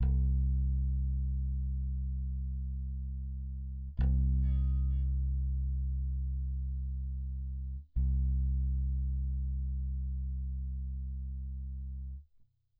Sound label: bass